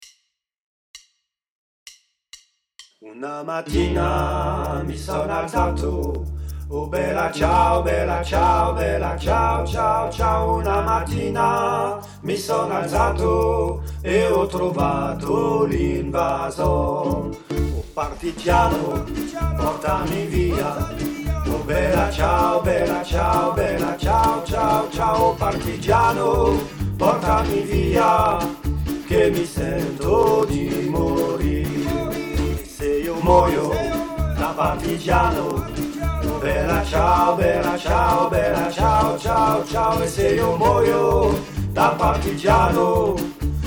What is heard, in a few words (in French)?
Trois voix, chorale; bella ciao